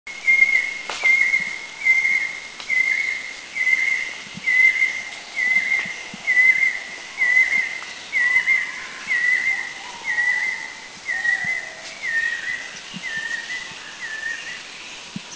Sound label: Banded Kingfisher; Thailand; Krung Ching